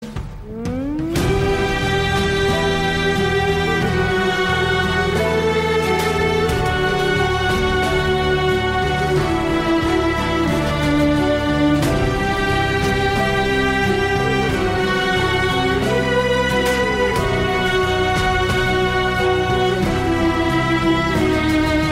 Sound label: Yemin Best Ringtone Turkey Ringtone